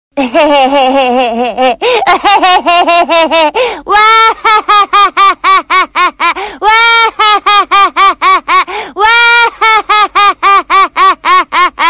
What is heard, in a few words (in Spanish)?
risa molona; shin chan